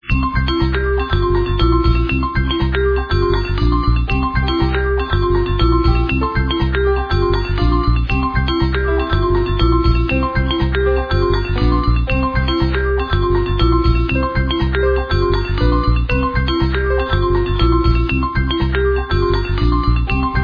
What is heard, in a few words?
okarina